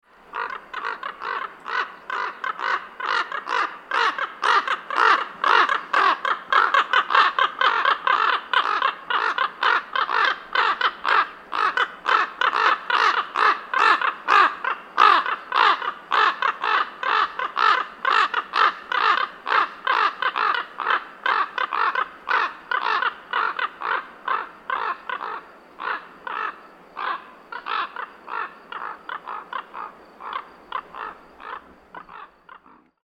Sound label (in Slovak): krkavec čierny